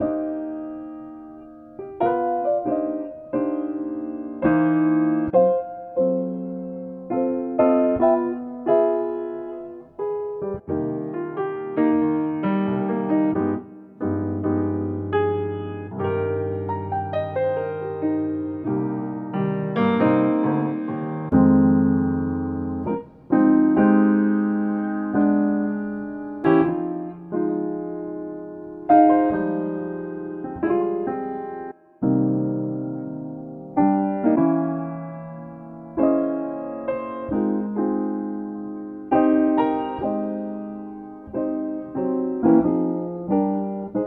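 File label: Fortepiannaya Muzyka, piano music; igrivyj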